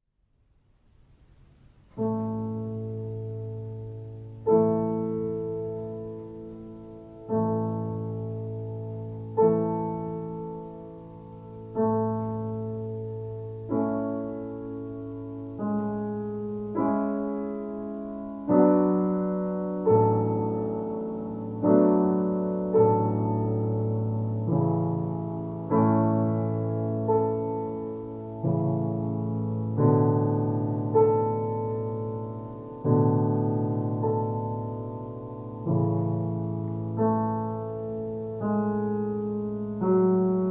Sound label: piano sample